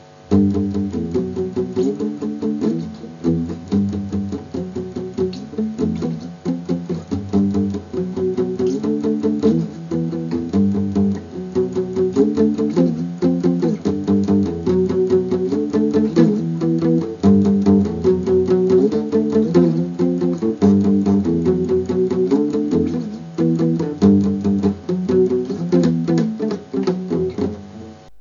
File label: guitar